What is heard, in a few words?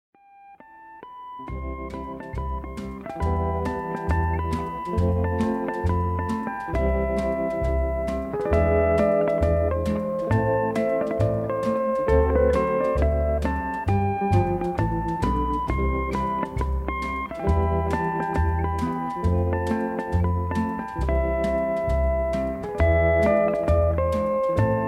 chime